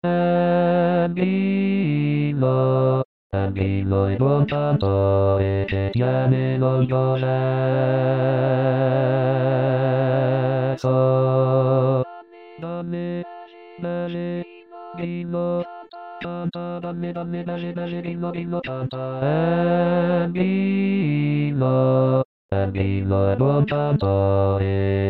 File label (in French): basse